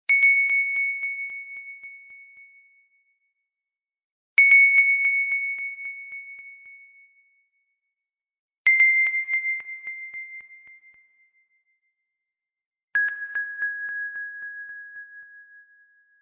long press key

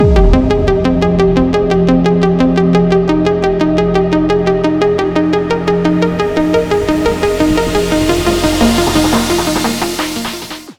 sms song

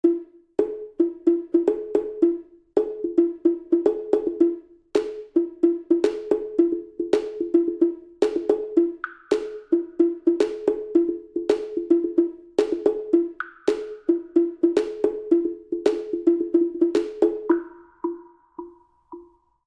samsung galaxy percussion

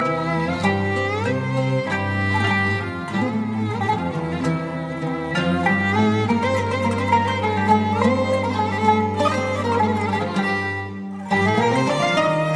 romantic turkish music

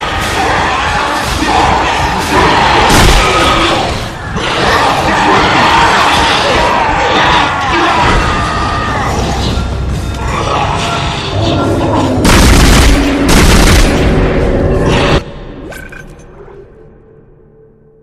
dead space alien attack

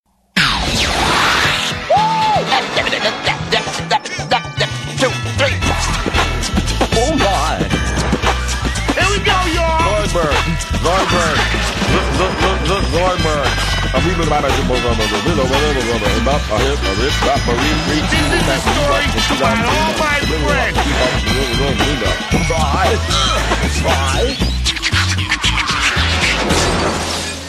beat box; futurama

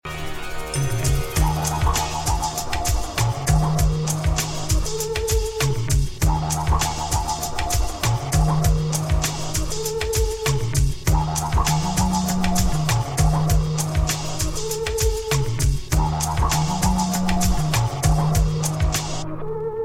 didgeridoo

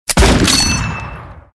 sniper rifle shot

